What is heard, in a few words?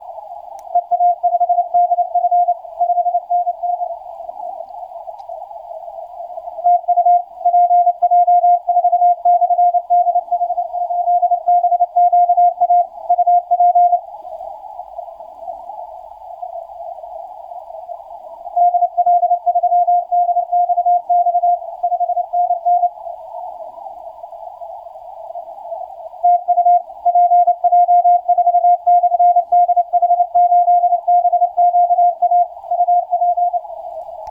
12m; PJ4